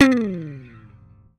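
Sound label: slap, bass; Xsample VOL-1; SLAP+THUMB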